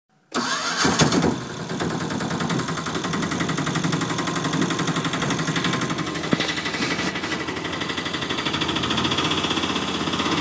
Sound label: Motorcycle